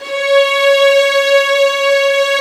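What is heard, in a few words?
STRS C#4, strings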